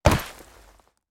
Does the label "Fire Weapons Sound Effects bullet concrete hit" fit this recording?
Yes